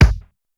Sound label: SPEED KICK, drums; Basement drums vol 2